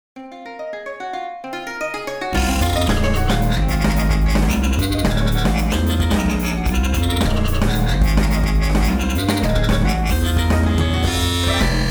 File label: Circle of Fifths Music